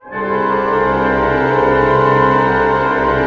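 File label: cluster pianissimo, Orchestra; Sound On Sound - Peter Siedleczek's Orchestra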